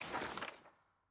sfx ui record next click; jayce

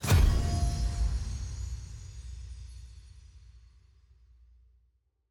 login button sign in click

